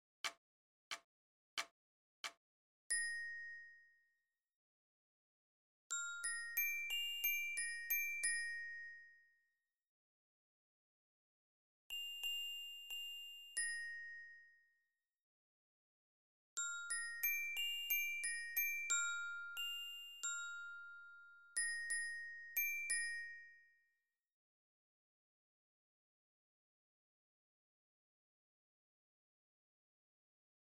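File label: Lyra, 90 bpm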